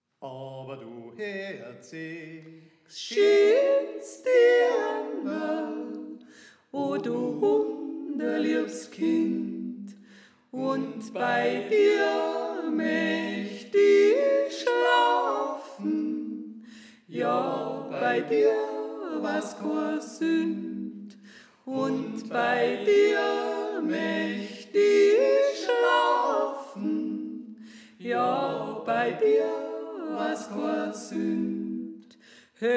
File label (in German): Sopran; u Herzigs Schöns Dianderl